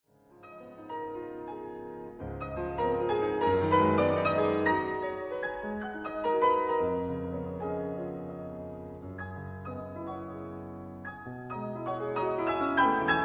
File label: Romantic Piano Concerto; Joseph Marx